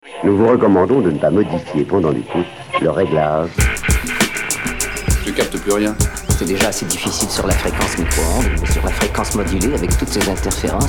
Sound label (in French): jingle